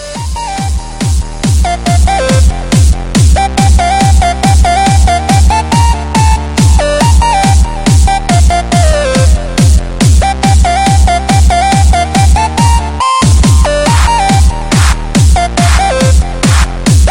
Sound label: Whistle Song